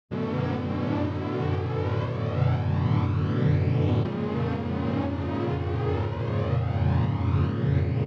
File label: Pad out; Radius Pitchshift; Pad спитченный на +/- октаву